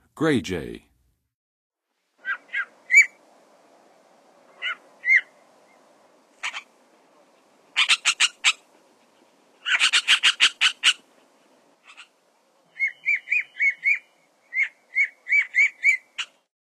Gray Jay; North America; Western USA; Western USA Songbirds

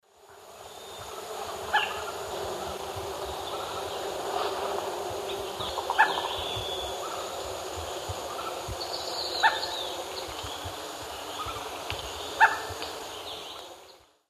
Xipholena punicea, call; S. America; Eastern Lowlands

